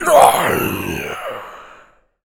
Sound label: Pain, Zombies; zps; Carrier